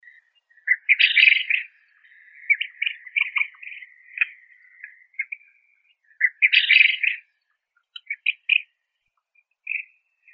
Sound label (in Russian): perepel yaponskii coturnix japonica